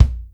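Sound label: bass drum tight; 300 Drum Machines; Yamaha Motif ES-Series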